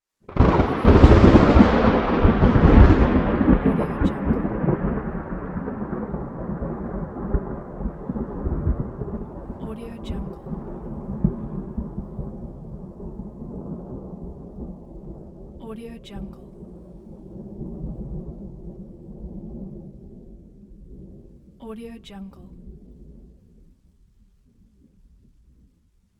thunder strike and rain

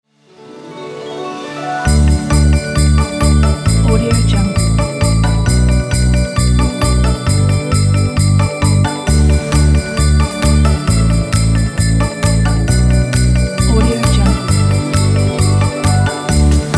a christmas

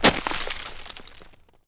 matter mayhem ice glass mono; soundmorph

